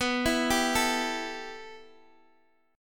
B 7th Suspended 4th Sharp 5th B down Guitar Open G